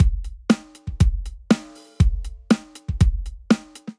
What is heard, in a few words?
Gen Purpose Kit, Drum Kits, Basic; Ableton; Drum Booth